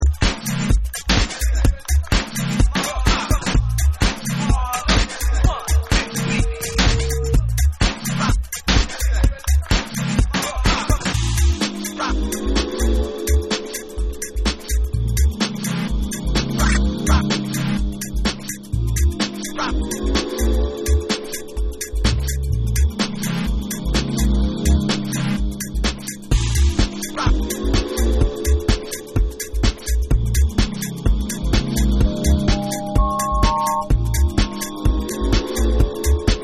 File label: NATURAL RHYTHM Rock Countdown